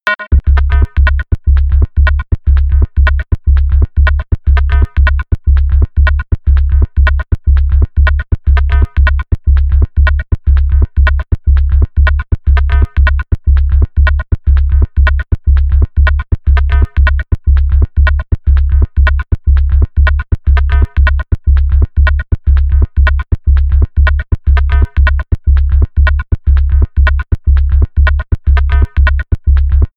level music

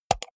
computer mouse wheel click fast hard

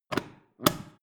foot switch turn on off depress full

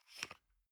toothpaste screw cup open soft single

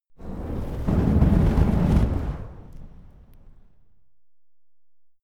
flamethrower medium whoosh single

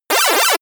bits moving

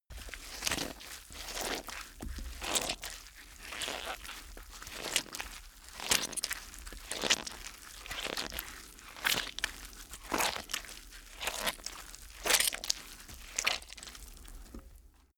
blood squish

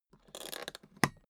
suitcase close latch lock slow

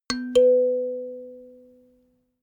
notification kalimba octave up

